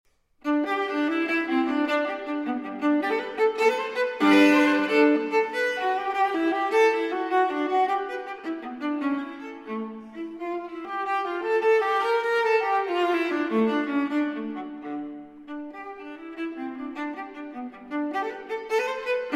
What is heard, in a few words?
Cello Suite No. 1 in G Major, for Viola, Gigue; J.S. Bach Cello Suite No. 1 in G Major, BWV 1007 (Transcr. for Viola) VI. Gigue